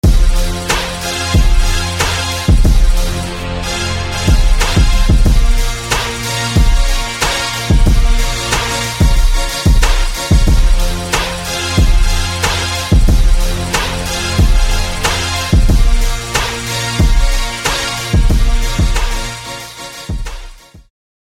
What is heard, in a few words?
Saber Brass; SFB FX